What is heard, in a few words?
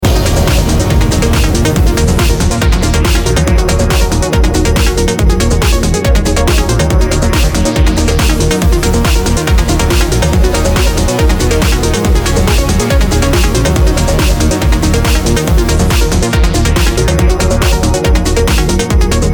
September Trance